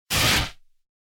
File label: whoosh tube more control